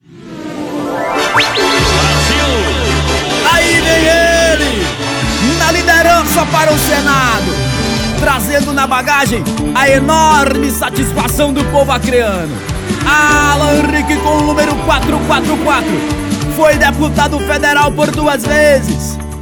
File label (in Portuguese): vinheta; alan rick